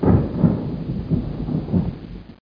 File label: clap